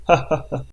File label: laughing